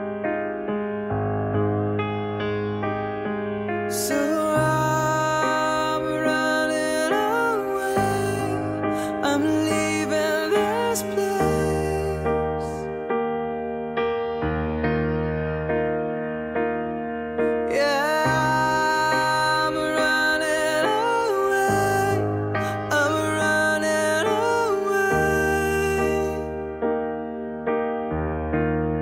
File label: running instrumental